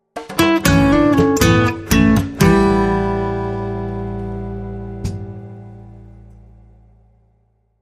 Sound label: Childish Mambo